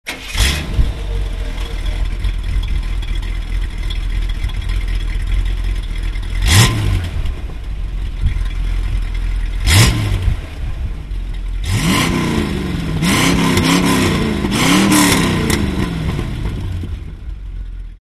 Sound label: Corvette64